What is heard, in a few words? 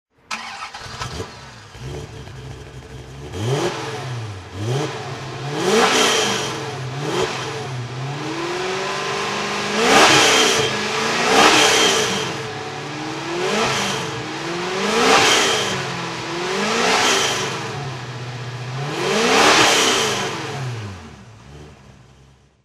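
aston martin v8 coupe throttle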